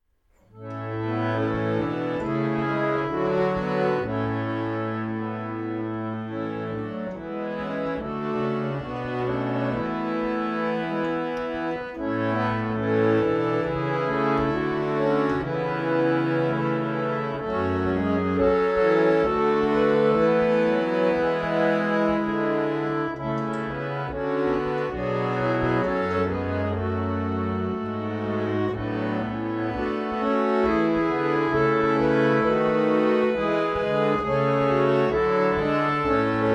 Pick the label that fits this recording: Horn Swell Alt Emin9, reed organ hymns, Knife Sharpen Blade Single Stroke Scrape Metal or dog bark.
reed organ hymns